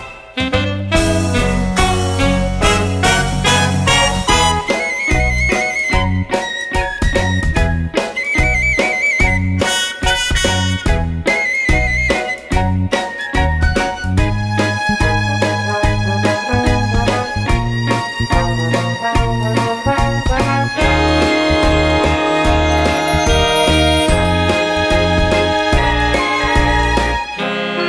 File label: Instrumental; academy players audition materials